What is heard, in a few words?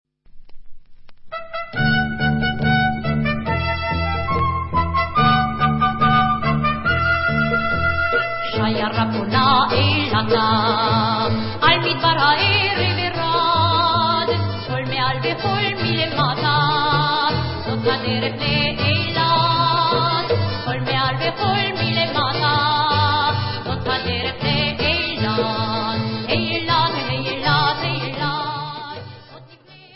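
Hora Vocal, Israeli Dances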